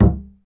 acoustic bass fx; Spectrasonics; Bass Legends

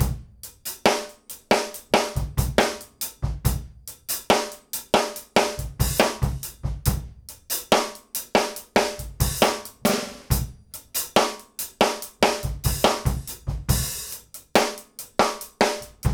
GROOVE, 60-85bpm; E-MU Producer Series Vol. 8 – Platinum Phatt

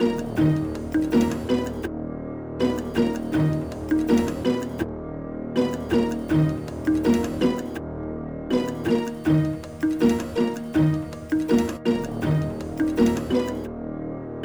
Melody; Sonic Foundry (Sony Creative Software); Westside Underground

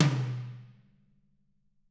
Toms; Bob Clearmountain Drums I

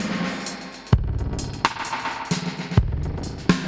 Drums A Kit Echo 130, dub drums; musicradar

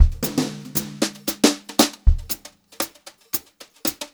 Brushed Drum Loops, JAZZY, 116

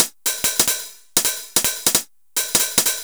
Roland Tr-909 misc

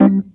Rhodes Hit; Mo' Phatt; Digital Sound Factory Mo' Phatt

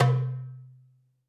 DARBUKA; AKAI S-Series CD-ROM Sound Library VOL-5; AFRO.DARBUKA

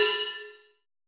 cymbal, Roland MC-909

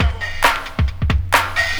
housefunk, beats; Sonic Foundry (Sony Creative Software); Ugly Remnants VOL-2

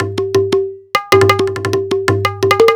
Tabla Effekts, percussion loops; KJ Sawka; Drum n Bass & Beyond; Drum Tool Box

